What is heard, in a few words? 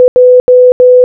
J Morse Code